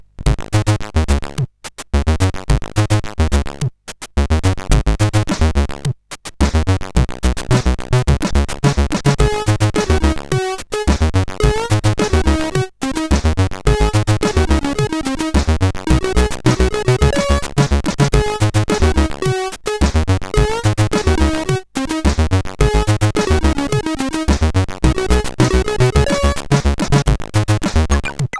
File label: intro, sid CSG8580R5